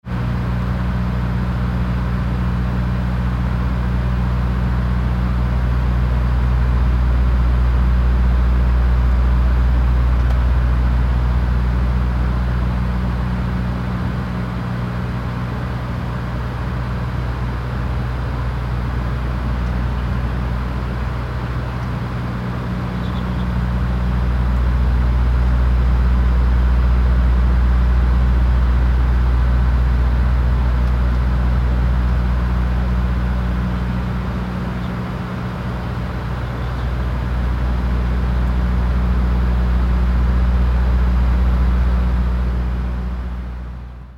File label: turboprop runway